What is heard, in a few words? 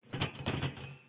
Animatronic Move